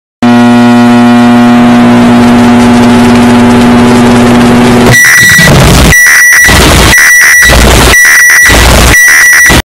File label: Black Microwave Earrape